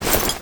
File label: build, ships; ratika